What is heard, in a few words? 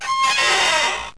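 chair squeak; Wired for Sound Pro CD; Aristosoft, Inc.; jobsaver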